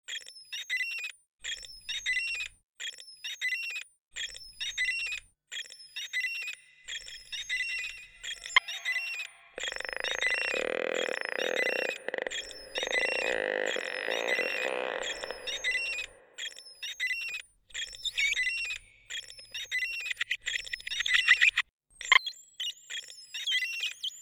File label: Ghost Crackles; Meanbone